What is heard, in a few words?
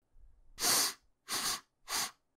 sniff